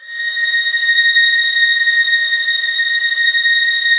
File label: violin A#6, soft violins; Q Up Arts; Denny Jaeger Master Violin Library